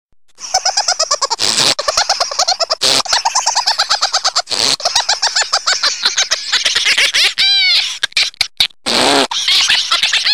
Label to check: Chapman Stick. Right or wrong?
Wrong